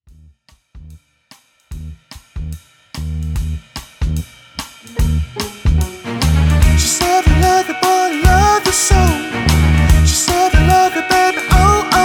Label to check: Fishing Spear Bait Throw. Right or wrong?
Wrong